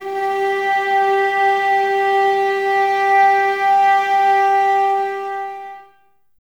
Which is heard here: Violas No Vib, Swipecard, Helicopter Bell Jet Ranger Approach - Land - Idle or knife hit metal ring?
Violas No Vib